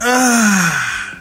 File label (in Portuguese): ahhh cansado